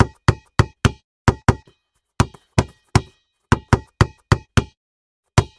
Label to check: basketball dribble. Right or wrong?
Right